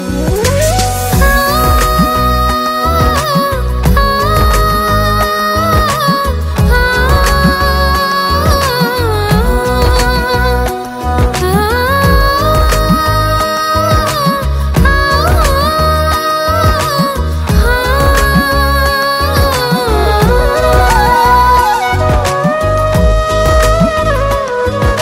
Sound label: new hindi music ringtone, bollywood ringtones